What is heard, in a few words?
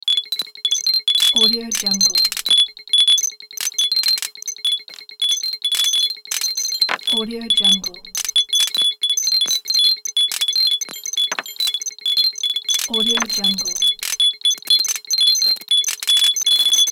futuristic cybernetic hitech device